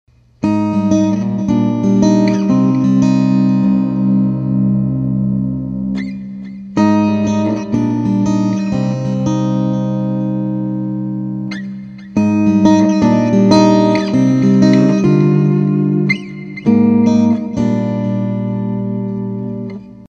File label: piezo